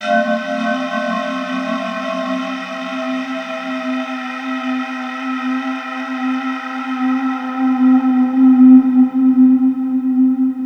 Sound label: atmo, atmosph, special fx twisted; USB Soundscan; AKAI